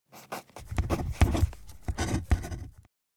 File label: Writing on paper handwriting pen long